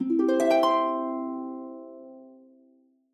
game show quiz question appear

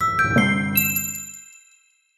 game show quiz bonus 2x

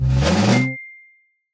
gameshow quiz get ready

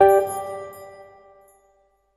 wood spinner selection

